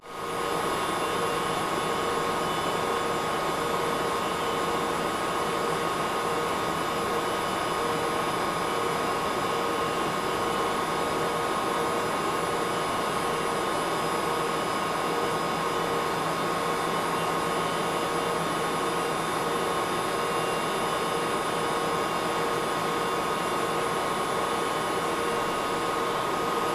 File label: Powerful Computer Industrial